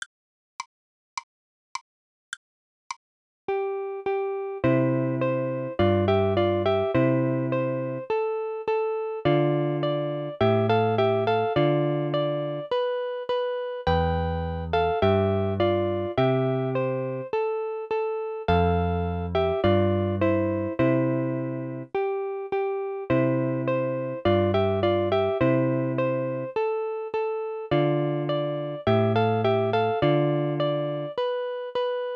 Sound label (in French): trio en do majeur bande son